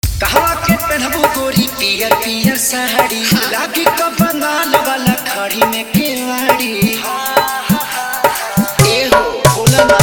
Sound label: Bhojpuri Hit Dj Song Mix